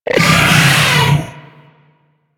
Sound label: Arachnoid Berserk Vocal Attack; Warframe; Corpus; Arachnoid Hunger